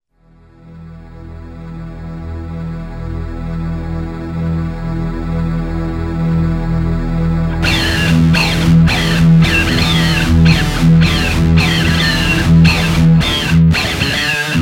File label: Rock; Volume 4 Disc 1; sports; Soundzabound